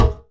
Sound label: bronze, Ticks, Misc Percussion